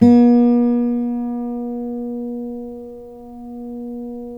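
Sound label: GUILD 12-string, Guitars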